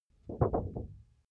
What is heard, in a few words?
paper wobble shake